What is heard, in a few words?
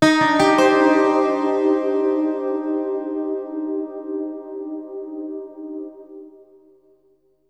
ELECGUIT, AMBNT, 160, C; Ambient; Guitar